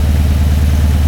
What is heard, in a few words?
Subaru Impreza Idle